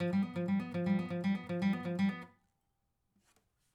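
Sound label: acoustic pattern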